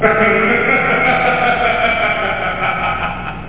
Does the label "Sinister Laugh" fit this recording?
Yes